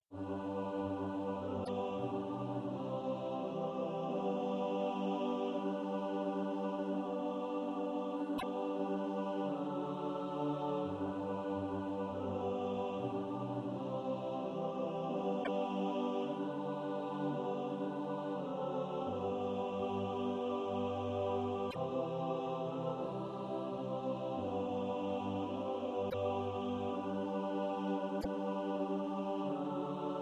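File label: alto, Practise Music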